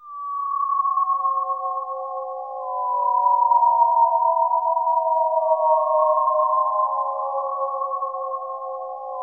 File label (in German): PFEIF; ATHMOSPHERE